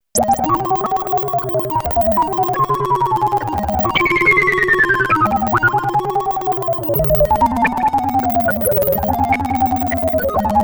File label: synth lfo; Creamware minimax